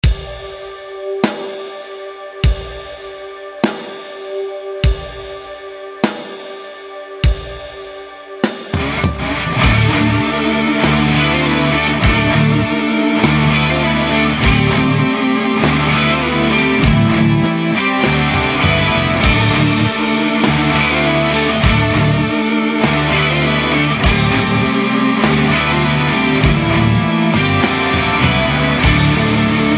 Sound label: Witchdoctor Lead